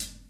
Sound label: thin hi hat f sharp key, closed high hat sample tuned to the F# note. Loudest frequency: 6096Hz; hat samples